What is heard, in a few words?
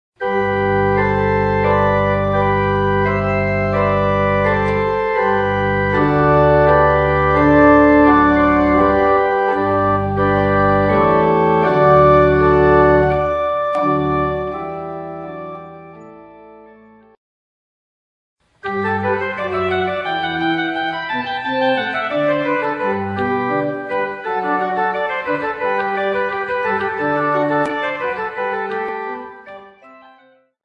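Cornet Voluntary in G-Dur; Walond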